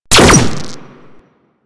Drone Laser Fire; Super Moa Drone

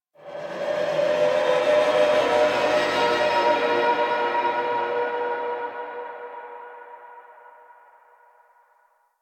ambient texture; Ambience; Warframe; The War Within; Golden Maw

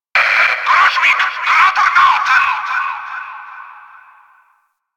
Fortress Interior Notification; Ambience; Warframe; Grineer Fortress

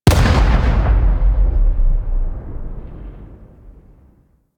Space Mine Explosion; Hazards; Warframe; Railjack

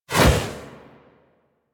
Edge Fist Swipe; Warframe; Warframes; Pacifist

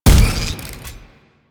Shot Fire; Warframe; Afuris Prime

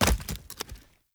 Tree Dead Small Destroy Chunk; Objects; Destructible Trees

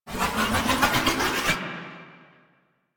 Archon Whip Block End; Enemies; Weapons; Warframe; The New War; Nira

